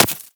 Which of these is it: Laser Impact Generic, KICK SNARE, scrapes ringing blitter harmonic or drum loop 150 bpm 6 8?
Laser Impact Generic